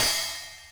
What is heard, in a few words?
drum